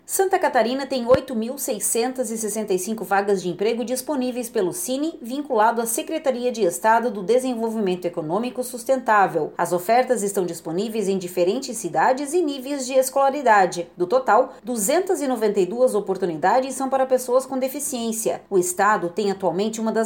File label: Sine